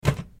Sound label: collapse